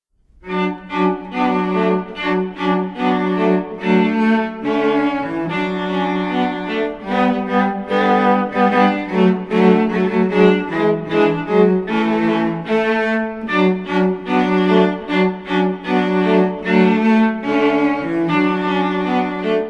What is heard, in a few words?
Jungle Bells Cello; Community School of the Arts